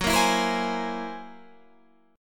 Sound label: F sharp 9th Flat 5th F sharp Guitar Standard; strummed; JGuitar